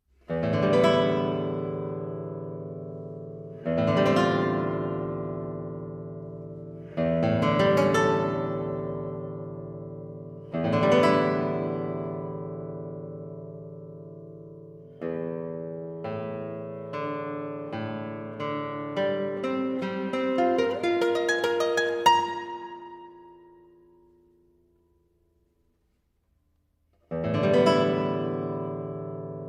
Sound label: guitar